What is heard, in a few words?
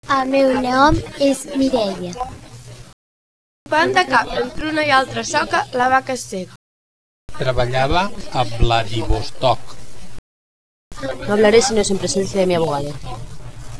Four Voices rec